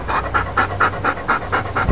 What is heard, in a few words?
Dog Pant; Animals